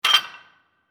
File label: Clank; iMovie '08 Sound Effects